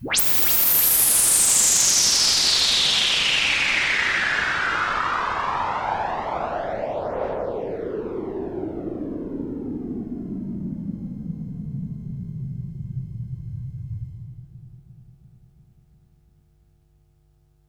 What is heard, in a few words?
Downlifter